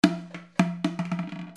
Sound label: FALLING